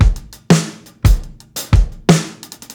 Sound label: 87 DISPLACED, drums; Basement drums vol 2